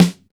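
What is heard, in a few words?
snare drum neutral; SNAREDRUMS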